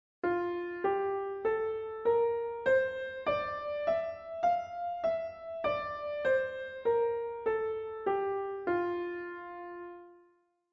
F Scale Piano; California Music Conservatory

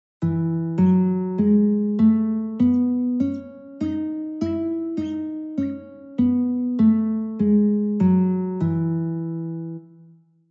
E Scale Guitar; California Music Conservatory

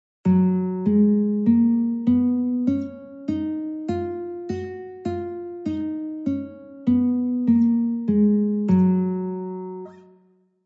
Gb Scale Guitar; California Music Conservatory

